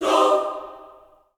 choir

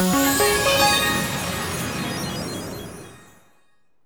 JINGLE NEXT TRACK; maimai

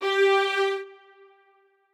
strings

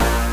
synth

